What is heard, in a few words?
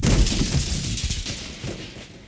wreck; PC Gamer 4.5